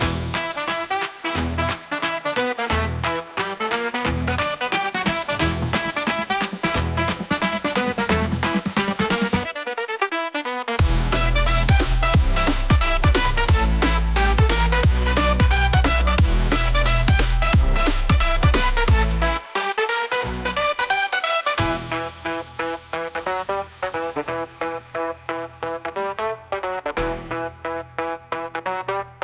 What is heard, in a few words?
Quantum Beats